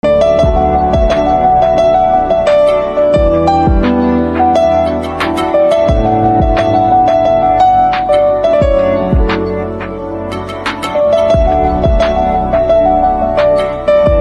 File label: Piano Instrumental; ringtones